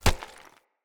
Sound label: Unarmed Punch Impact Rock; Weapon Impacts; Player; Environment